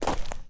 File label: Footsteps Dirt Single